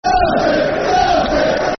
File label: Celtic, Celtic, Football Chant (Soccer Songs)